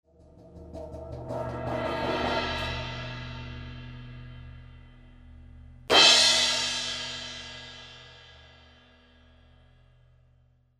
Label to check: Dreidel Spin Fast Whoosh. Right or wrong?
Wrong